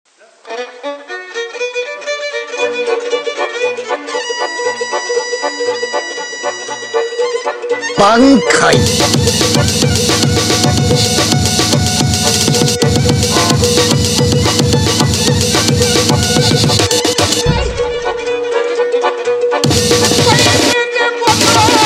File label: lolicore; KyokudoCore Records